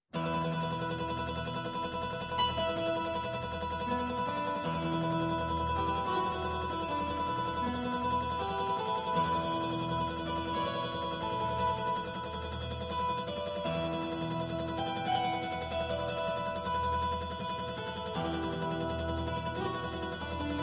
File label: Mandolin Ensemble